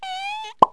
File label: squk pop, pops; CD Mania - The Perfect Multimedia Fun Pak!